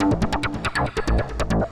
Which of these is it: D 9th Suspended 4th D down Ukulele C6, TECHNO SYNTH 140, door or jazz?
TECHNO SYNTH 140